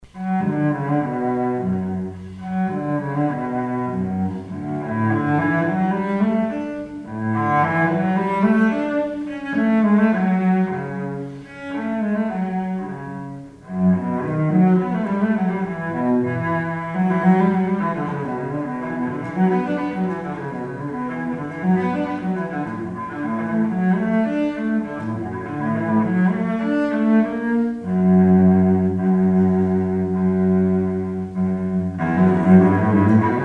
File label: cello